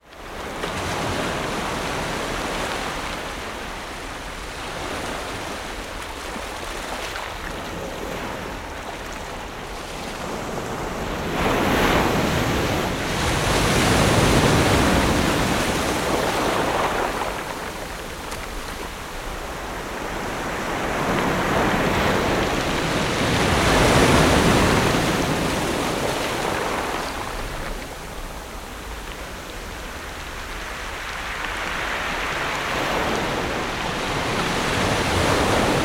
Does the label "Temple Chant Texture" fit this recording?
No